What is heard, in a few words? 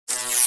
Electric dis hum